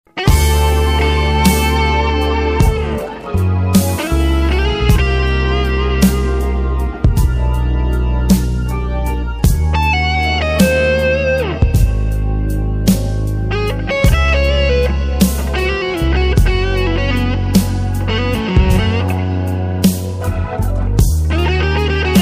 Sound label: blues; jazz; blues bleu